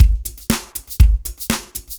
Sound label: Breakbeats loop, 70_s Breakbeats